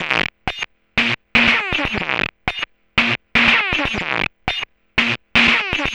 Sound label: Drums Strange